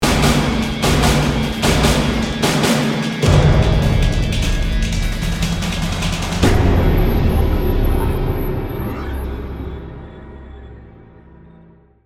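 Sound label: Music Street Combat End; dead space 3; ps3 windows xbox 360 gamerip 2013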